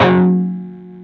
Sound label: PRS PWR G3C, Guitars; PRS PWR CUTS